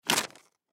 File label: Pamphlet Book Page Rip Out Fast; Office; Blastwave